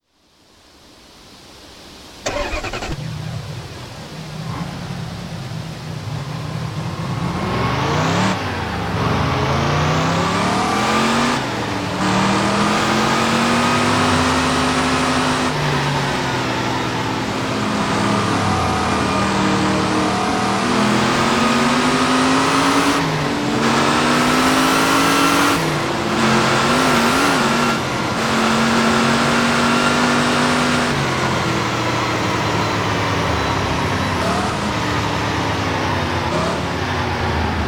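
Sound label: noble m400, car; TDU-1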